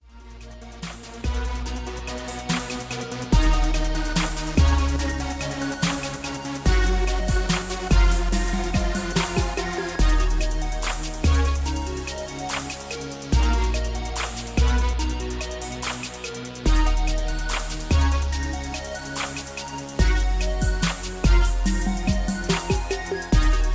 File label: 72BPM